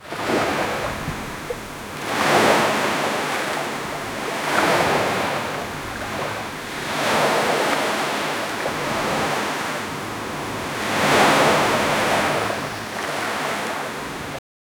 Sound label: calm sea in heavens